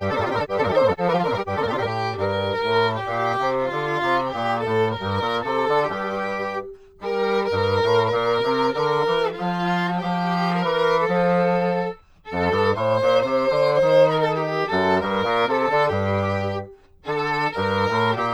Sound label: Bach Chorale Scorealign resyn